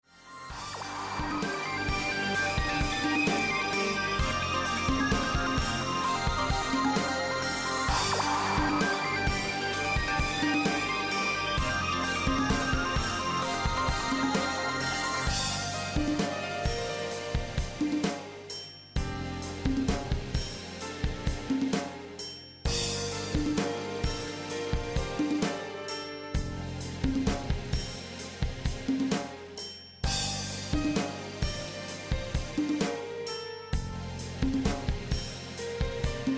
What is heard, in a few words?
Emotional Explosion; Cleveland, OH, United States